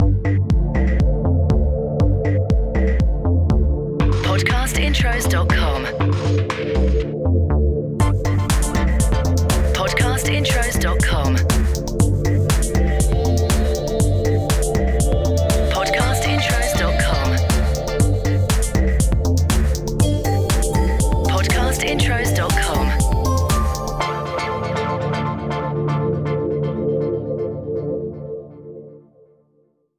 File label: CORPORATE; Podcast Watermark